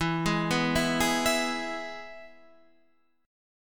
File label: E-Major E, Guitar Half Step Down